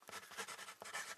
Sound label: ambient port default; World Of Warships